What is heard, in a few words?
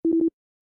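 Error Message; SEGA Dreamcast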